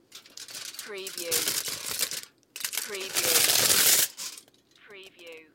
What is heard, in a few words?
Metal foil, aluminium foil rip